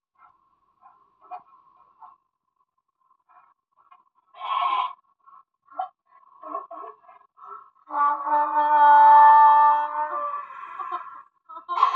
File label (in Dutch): ezel